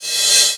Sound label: reverse, FX; Vengeance Samples